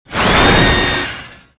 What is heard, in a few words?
wakeup; Star Wars Jedi Knight II Jedi Outcast; XBOX; mark1